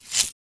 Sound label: creature misc; RPG